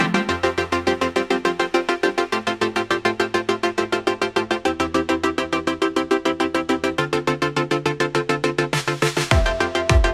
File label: upbeat ethereal instrumental music; fantasy; silispro; ai generated music